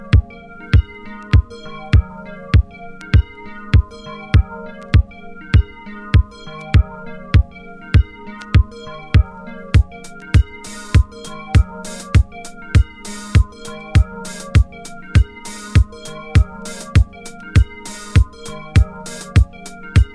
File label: DOWNBEAT